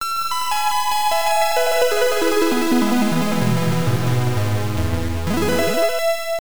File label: Harp; Kaze no Densetsu Xanadu; The Legend of Xanadu; pc engine cd